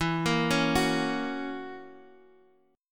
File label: F sharp Major E; F#/E Chord {X X 2 3 2 2} arpeggiated